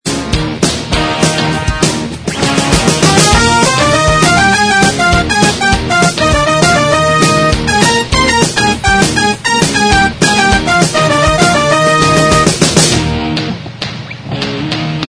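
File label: instrumental, backing tracks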